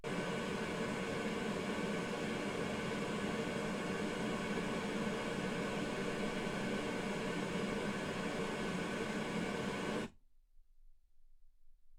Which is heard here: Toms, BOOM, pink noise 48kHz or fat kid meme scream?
pink noise 48kHz